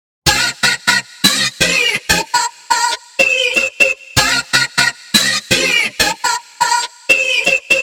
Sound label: ringtone ice cream lover